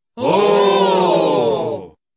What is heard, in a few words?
oooh